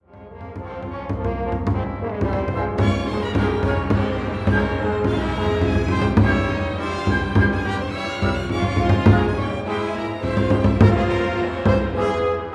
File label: Orchestra